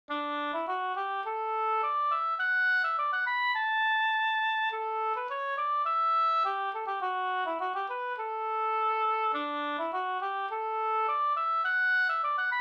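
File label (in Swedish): brudmarsch Brudlat Jämtland; Anders i Logarn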